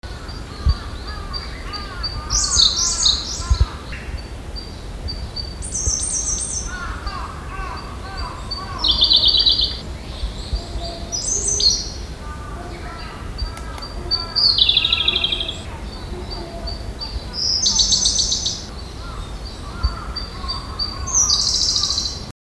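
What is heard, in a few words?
koruri, Siberian blue robin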